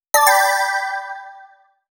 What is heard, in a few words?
alert, phonetones; Lucky Alert; Sony Ericsson; PlusFourSix; Rika (W705)